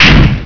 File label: Chest Break; Miner Max